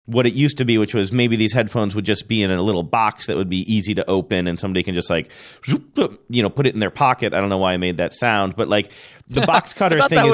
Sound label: sound effect of someone shoplifting